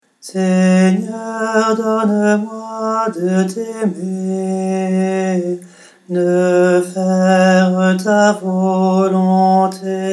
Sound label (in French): soprane baryton, choeur d'hommes; seigneur mon bouclier